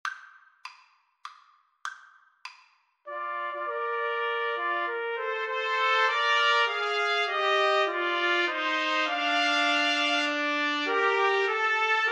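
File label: nocturne trumpets, karaoke; Trumpet Trio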